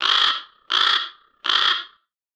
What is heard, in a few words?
bird caw long; triple-aaa-games